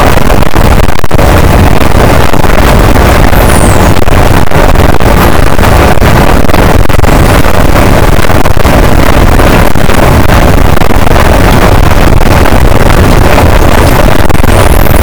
cachalot positif, boat; Physeter Macrocephalus

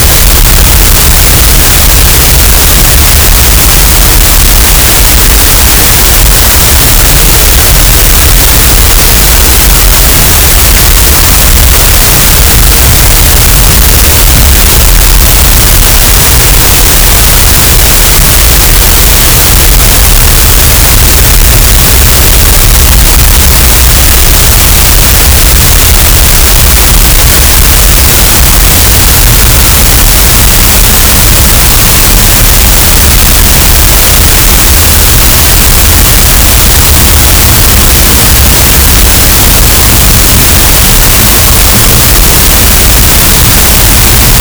cachalot, gaussian noise; Physeter Macrocephalus

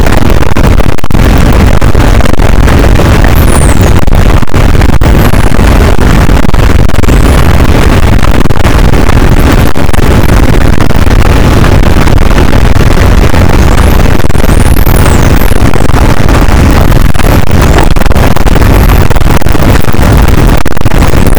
cachalot negatif, boat, Physeter Macrocephalus

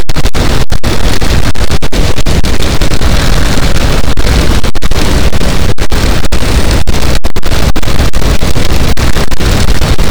cachalot positif, boat, Physeter Macrocephalus

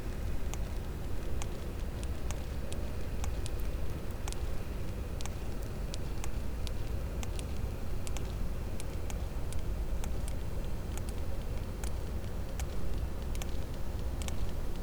cachalot positif, Physeter Macrocephalus

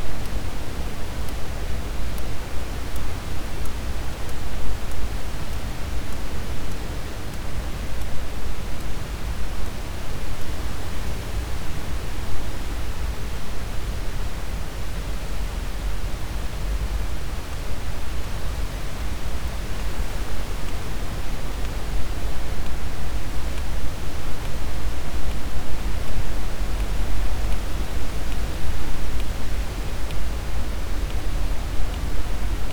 cachalot positif, Physeter Macrocephalus

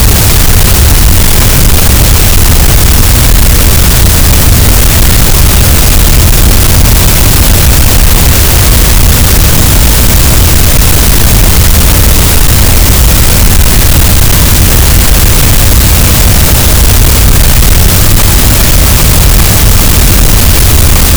cachalot, Physeter Macrocephalus, gaussian noise

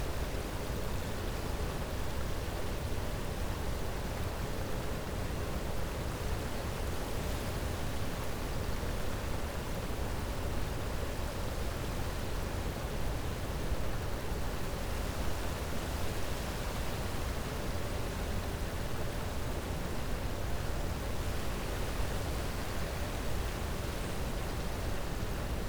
cachalot negatif, Physeter Macrocephalus

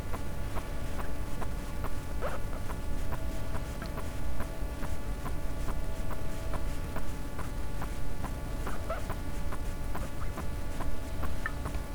cachalot negatif, Physeter Macrocephalus

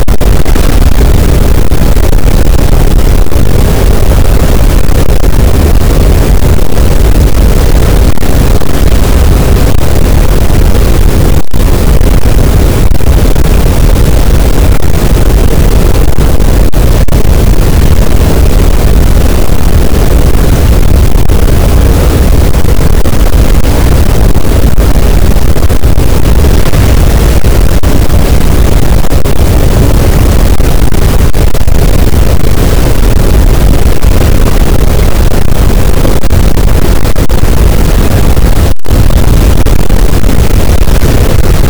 cachalot, Physeter Macrocephalus, gaussian noise

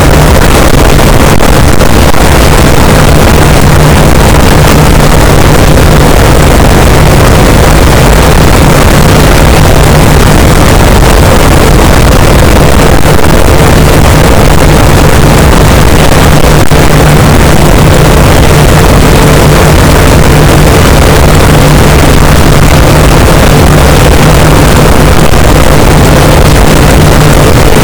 cachalot negatif, boat, Physeter Macrocephalus

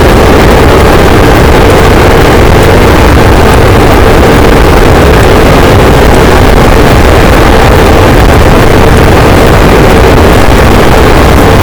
cachalot positif, boat; Physeter Macrocephalus

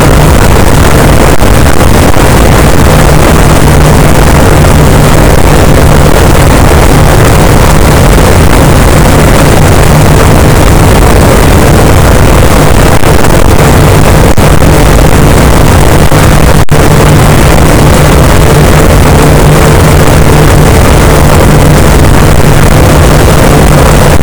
cachalot negatif, boat, Physeter Macrocephalus

